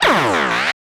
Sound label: stylized glitch sound; soundscrate